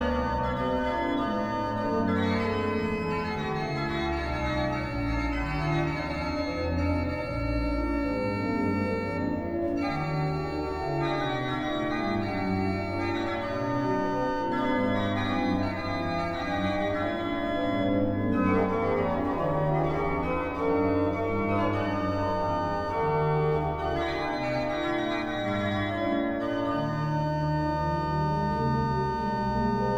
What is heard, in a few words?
koor en orgel; Handel - A toi la gloire